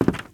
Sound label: squeaky wood walk, step, bamboo